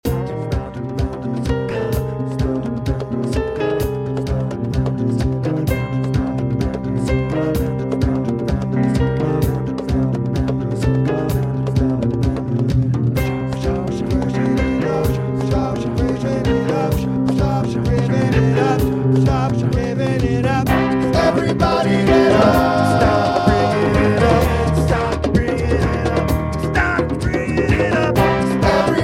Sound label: loop